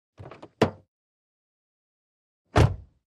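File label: Montero Door Open Close, 1998 mitsubishi montero ext.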